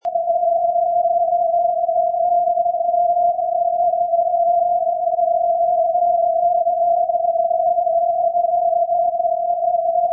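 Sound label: ndb, non-directional beacon; mora-siljan nm-403; la6lu-kiwi regional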